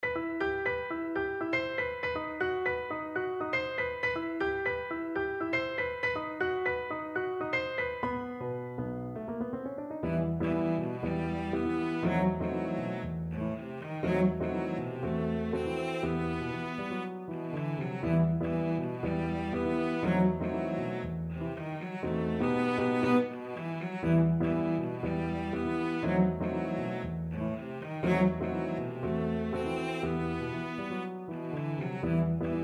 salsa, cello

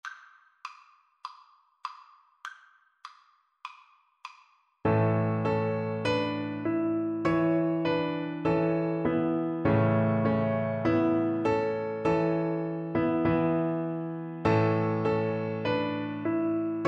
ode to joy, Viola

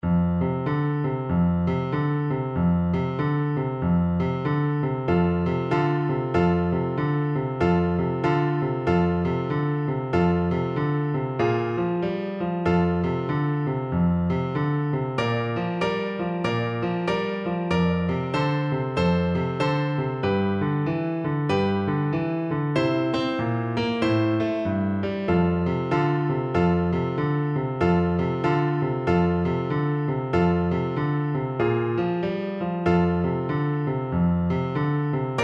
bassoon

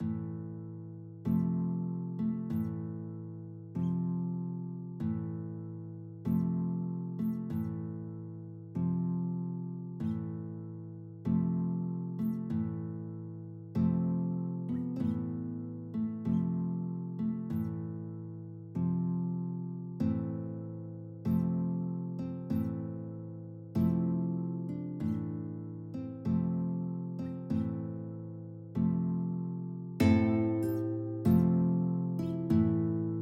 Funeral March GTR, guitar; Chopin

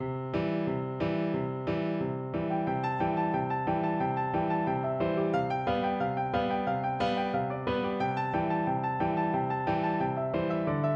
major piano

